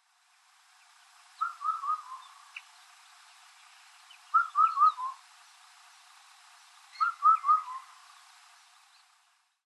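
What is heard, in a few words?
Indian Cuckoo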